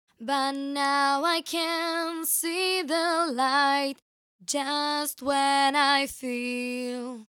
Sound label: VOCAL DRY